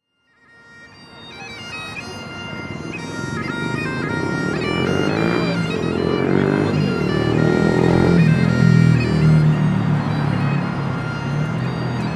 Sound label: HornPipe